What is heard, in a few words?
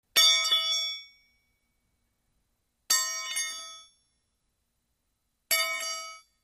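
Crowbar Drops Concrete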